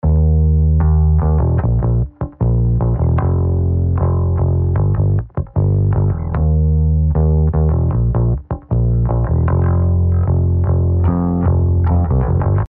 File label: bass after